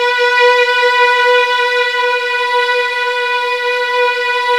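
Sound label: violin ensemble; 90s Sample CDs; Club-50 - Foundations Roland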